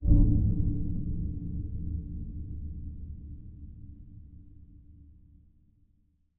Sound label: heavy steel noise